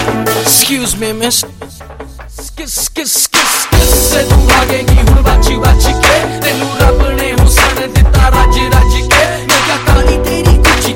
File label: Punjabi Remix; ringtones